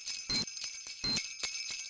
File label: c4 xmas beep